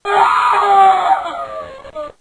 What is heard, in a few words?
flash animation stock scream; newgrounds